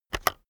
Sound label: keyboard external enter press fast heavy